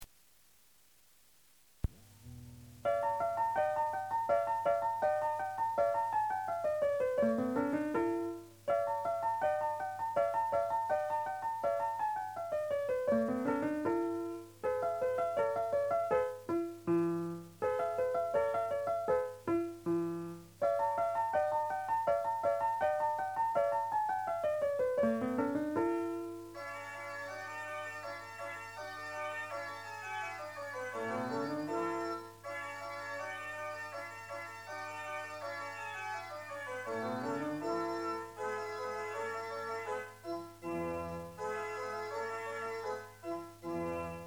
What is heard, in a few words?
seasong